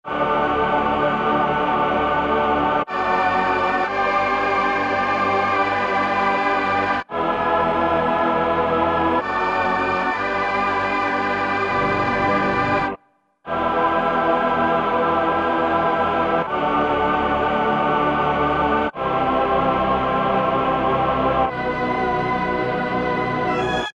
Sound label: Big Tron Vox, Roland JD-990; Vintage Board